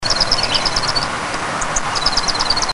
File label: junco